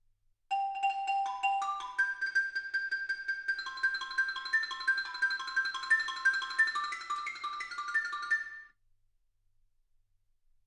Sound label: xylophone